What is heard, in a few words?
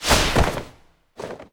fire